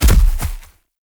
Rock Trap Rock Fall; Ode Lantern Crate

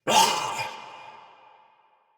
pain, soldier; Barks Battle; Mendicant

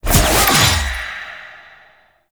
Choir Pool Cast

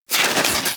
Armadillo Roll Snow

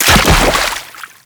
Land Heavy Water, Footsteps; Enemies; Warframe; Teshin

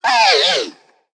Repair Drone Death; Necramech; Battle Barks

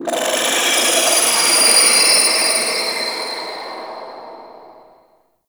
Clicky Texture; Ambience; Warframe; Entrati

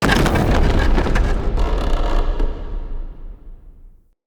Drawbridge Start; UnderCity

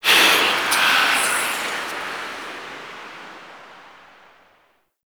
Tailor Shop Door Whispers; Warframe; Ambience; Nineteen Ninety Nine

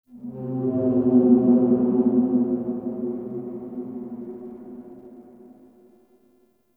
Unstable Texture, Ambience; Warframe; Shadowgrapher

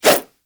nunchuck whoosh; Warframe; Infested; weapons

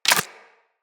Bullet Impact Debris Wood

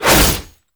Fist Claw Whoosh; Weapons; Warframe; Tenno; Incarnon

